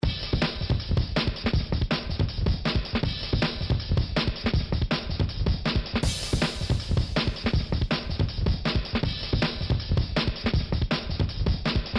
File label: electro